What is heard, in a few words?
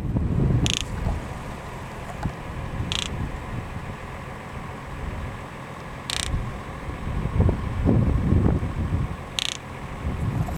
Roadrunner Clatter